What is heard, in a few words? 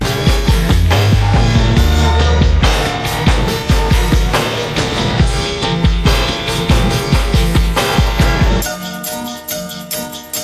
instrumental